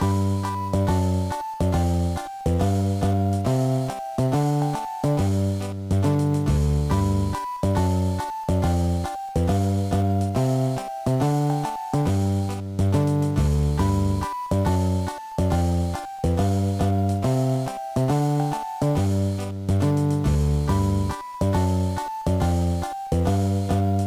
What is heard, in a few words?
BGM; ochaken kururin honwaka puzzle de hottosho; gba